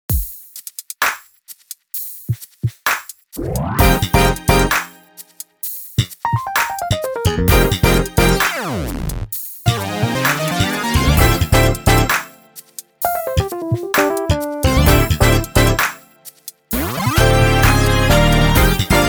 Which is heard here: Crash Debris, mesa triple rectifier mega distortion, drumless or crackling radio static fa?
drumless